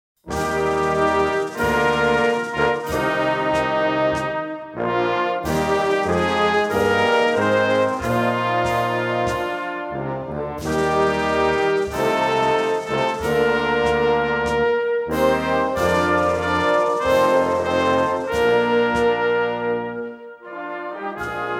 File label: Orchestra National Anthem of Canada